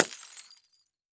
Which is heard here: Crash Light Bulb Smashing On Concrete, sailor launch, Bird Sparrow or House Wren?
Crash Light Bulb Smashing On Concrete